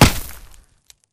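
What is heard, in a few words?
Bullet Light Ballistic Dirt impact; Titanfall 2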